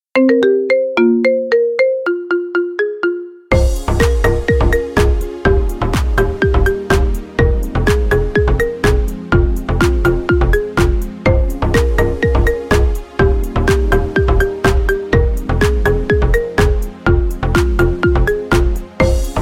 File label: marimba remix